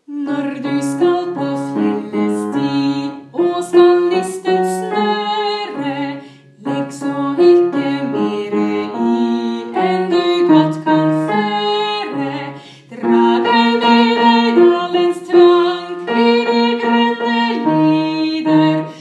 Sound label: BASS; Fjeldesti